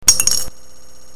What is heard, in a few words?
Coin Klink